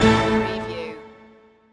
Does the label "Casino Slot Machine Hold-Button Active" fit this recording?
Yes